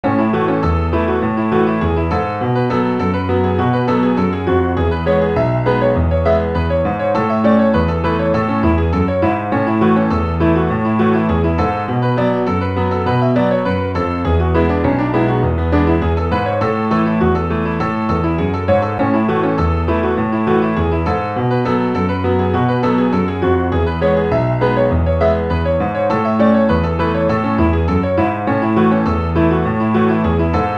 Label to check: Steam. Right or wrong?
Wrong